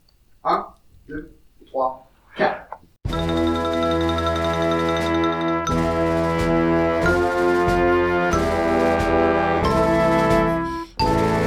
la moderation a meilleur gout noire a 96

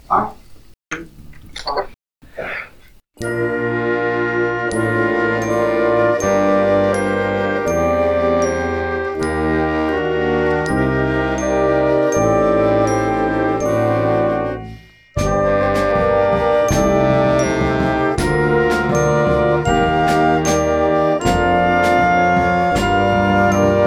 a la claire fontaine moderne noire a 80

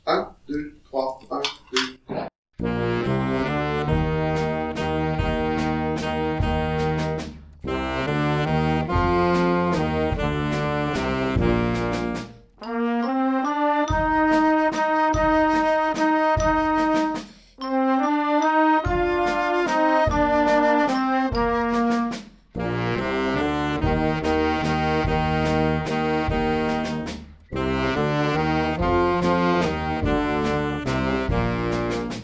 bonhomme bonhomme noire a 144

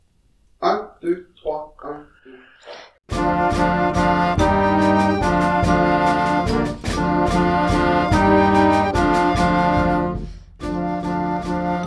gai lon la gai le rosier noire a 144

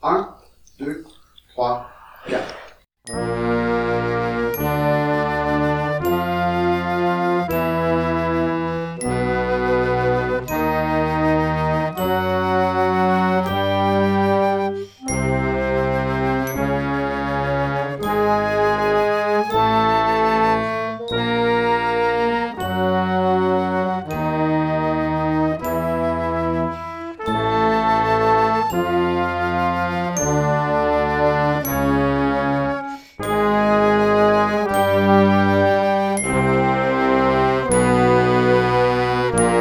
deux melodies dodecaphoniques noire a 80

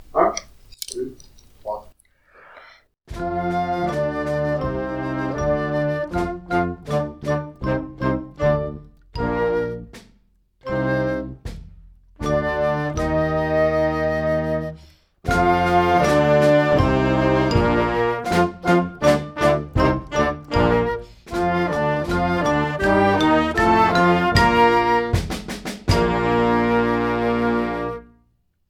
doux matins noire a 80

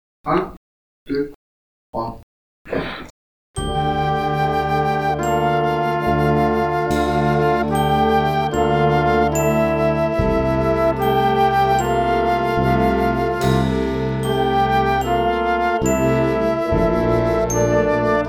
noire a 72

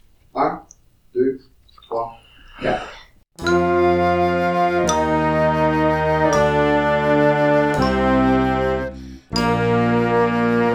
pentes glissantes noire a 80

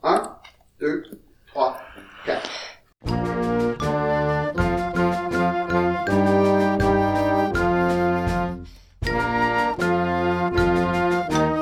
ne pas manquer de batterie noire a 80

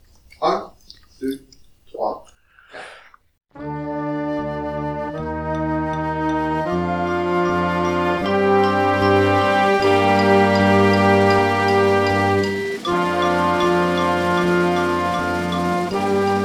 sol wahhh noire a 80

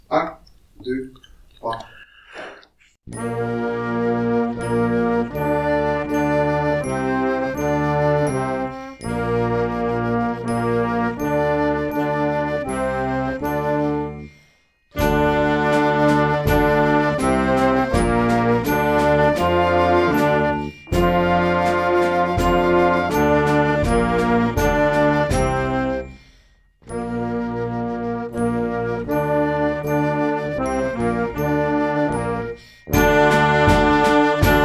a la claire fontaine noire a 80

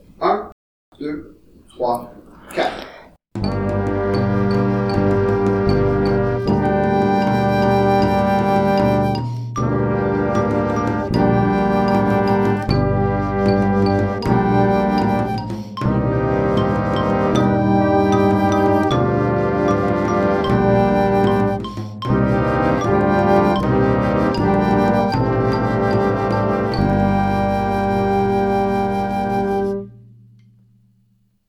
vent du nord noire a 80

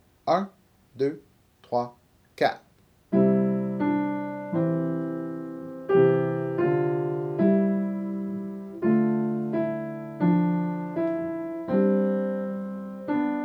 solfege

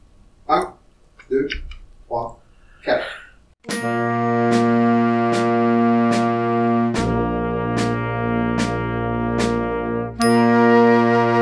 diviser pour mieux compter noire a 76

